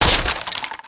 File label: dragon egg die